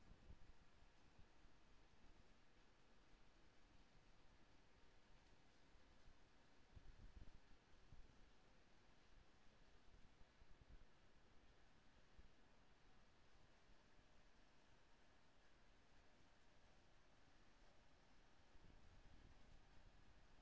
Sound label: HYDROPHONE 16000Hz; JASCO AMAR HYDROPHONE 740